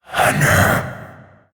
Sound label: Possessed Red Veil Vocal Attack